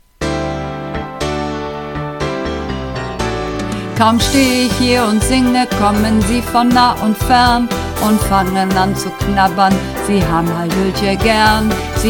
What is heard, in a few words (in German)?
Werbemusik Tief, Uebungsaufnahmen